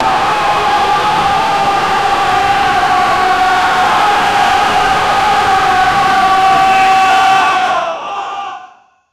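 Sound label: hundreds of warriors shout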